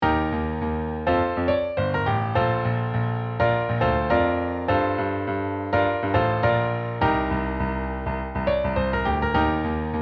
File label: piano